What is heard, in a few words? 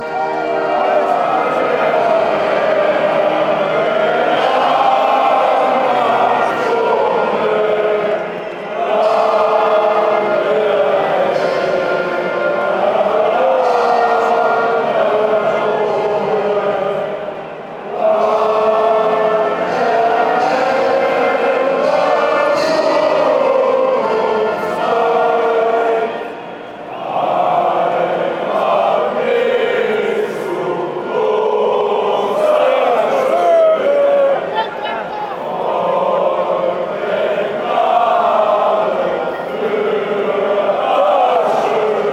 austria national anthem, chants